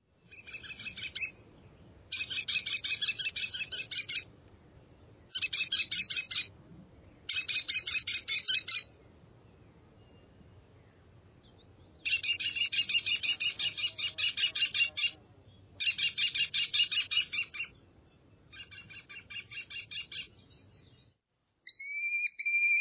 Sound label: American Kestrel, bird calls; Envirothon Jr. High Resources; Jr. High Enviro Wildlife Resource Packet